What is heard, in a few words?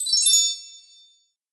line powerup; game; santalicious